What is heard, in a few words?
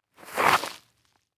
Foley Footstep Boot Single Skid On Leaves; Foley Footsteps